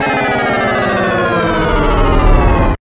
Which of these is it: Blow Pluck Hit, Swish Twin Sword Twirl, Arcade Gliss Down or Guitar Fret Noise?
Arcade Gliss Down